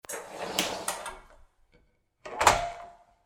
お風呂のドアの開閉音

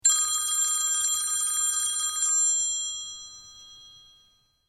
黒電話の着信音

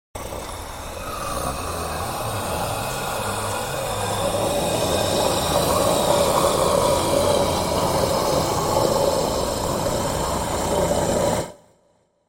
腐ったゾンビさんの唸り声

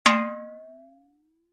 ポカーンとする音

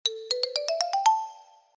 軽いノリのアイキャッチ

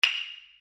火の用心！雅楽の拍子木

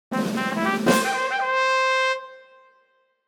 成功のファンファーレ

